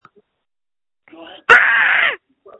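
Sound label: Scream, phone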